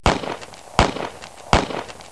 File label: GUN